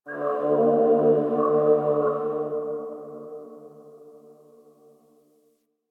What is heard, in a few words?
Creature; Warframe; Quests; TheNewWar; Ambience; Drifter Camp; Creatures